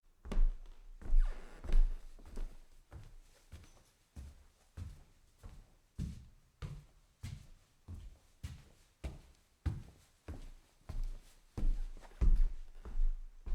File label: soft walk on wood and tile, foley